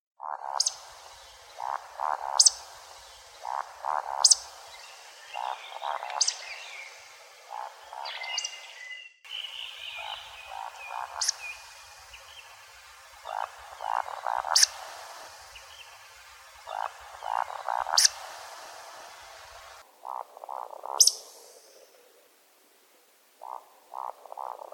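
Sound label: woodcock; validshnep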